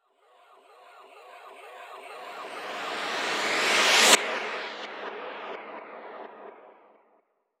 FX Uplifter

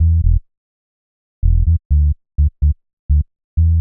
126 E D BASS, Funky; Loopmasters; Funky Underground Vol 2; BASS LOOPS

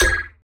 Percussive

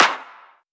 Claps & Snares

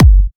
Electro House Bassdrum; Mutekki Media; Vengeance Electroshock Vol.2

